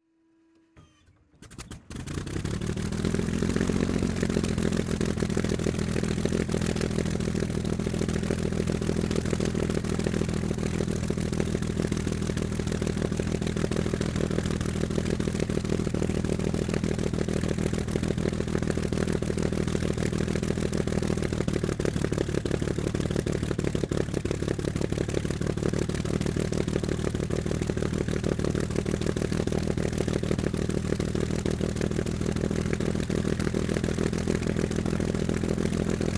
Messerschmitt Bf109 G4 stationary startup idle steady rpms shutdown pointing exhaust; Pole Position